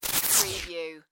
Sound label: Static Hit Laser Shot